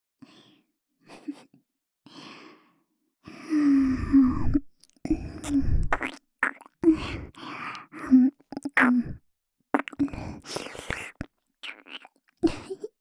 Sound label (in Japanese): 耳舐めループ; ループ音源